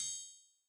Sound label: Triangle Open, Yamaha DJX 2B